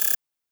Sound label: error